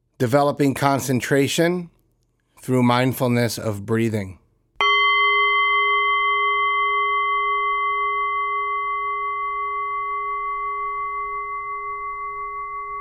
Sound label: Breath